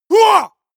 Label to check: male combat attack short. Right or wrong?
Right